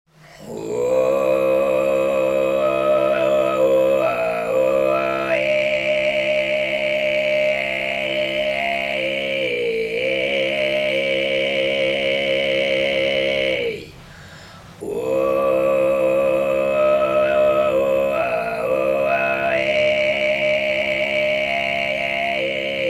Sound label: Tuva Kargyraa